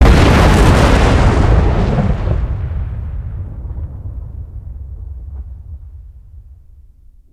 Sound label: ship explosion; destructibles